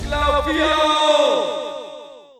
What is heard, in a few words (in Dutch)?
klootviool echo